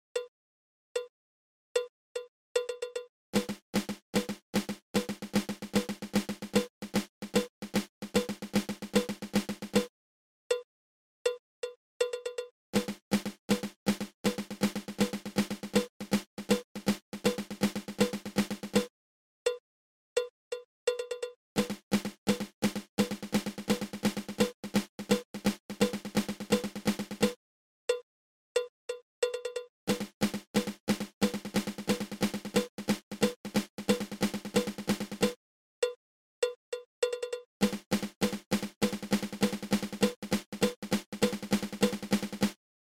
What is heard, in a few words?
Swiss Army Triplet